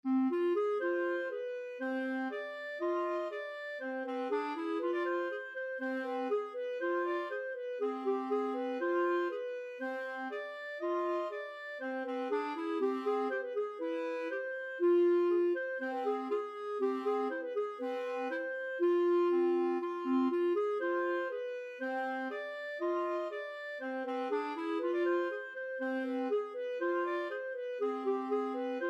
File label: Odessa Bulgarish clarinet duet